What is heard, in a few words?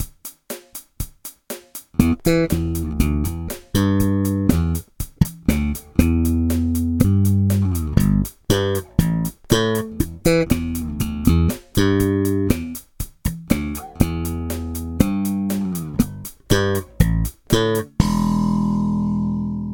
Ash Vette slap